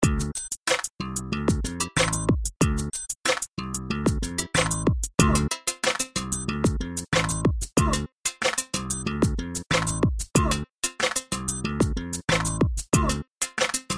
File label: Hiphop Beat Ringtone